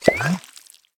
Zombie Rising From Mud

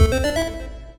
Press Start; Alwa's Legacy

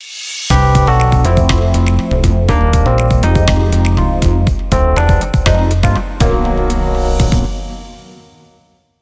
Start Jingle

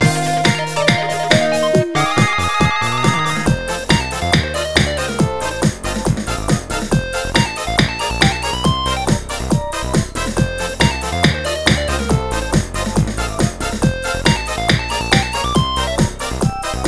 Music Park (Drum); Mario Kart 8; 3DS Music Park (Drum, Frontrunning)

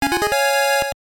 Jingle; Uchuujin Tanaka Tarou de RPG Tsukuru GB2; GBC

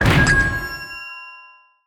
UI Get Quest; bellatia

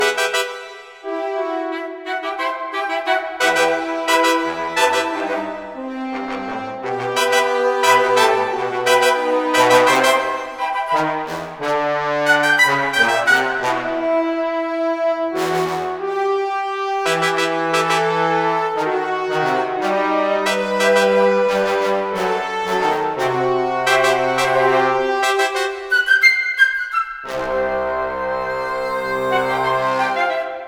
Music Battle Orch; MushroomBoss

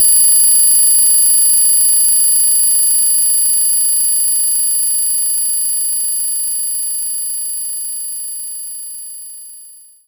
Triangle

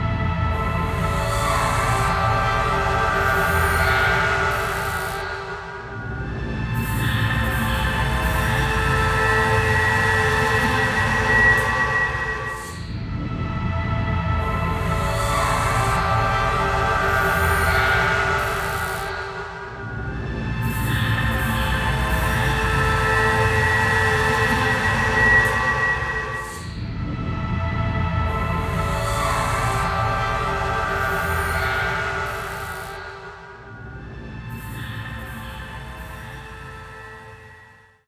vectron ambience; Steamworld Dig

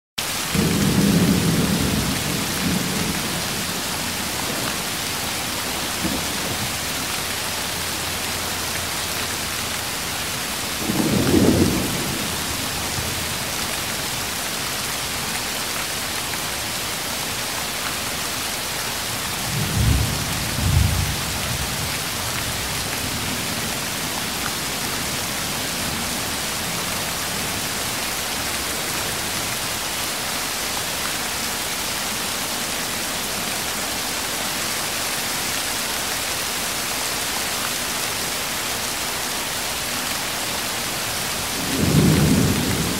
SFX Bkg Wind Fight Loop

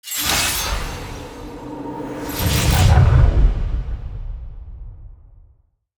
UI Match Found; A Year of Rain

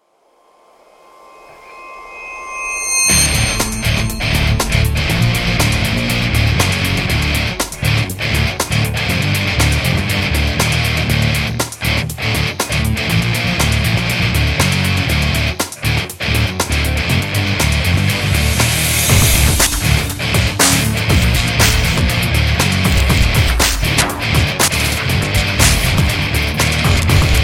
Menu music; rush n attack ex patriot; ps3

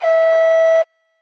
Truck Reverse Beep Warning

